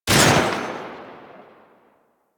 Hand Shotgun Shot

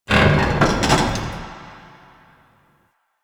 Car Creak; Ambience; Props; Warframe; NineteenNinetyNine

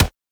Weapon Punch Rifle; Warframe; Weapons; Rifles

